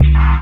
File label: Metals Kick; Sonic Foundry (Sony Creative Software); Esoterik Beatz; Metals Kit